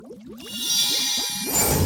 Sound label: gambler make magic; Great Book Of Magic Deluxe; Wazdan